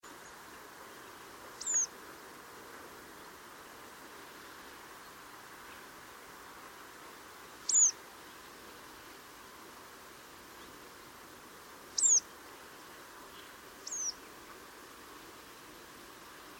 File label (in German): Rotkehlpieper; Garching M; Michle Peron